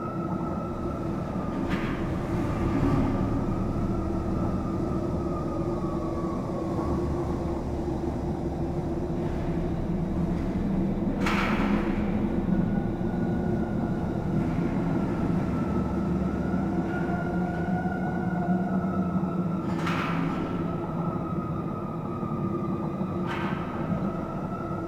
Galleon Room Ambience; Warframe; Grineer Galleon

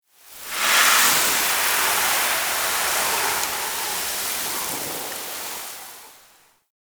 Cap Room Narrow Puzzle Release Sand Fall; Ambience; Gameplay; Warframe; Entrati

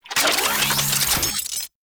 Reload, Archwing Primary; Warframe; Larkspur Prime

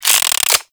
Revolver Pistol Reload Chamber

